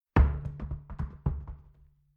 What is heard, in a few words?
Mechanical Large Tire Dropped On Ground Dull Thud Fast Bounces Rolls Around; Low Impact Sound Effects